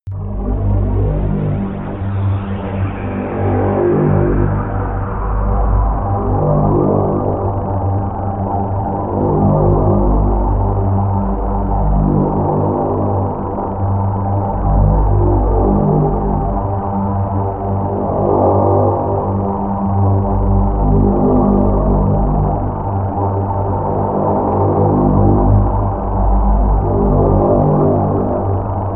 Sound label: swirling bass; flaechen